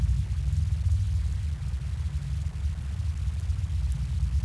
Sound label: underground cellar; de jor1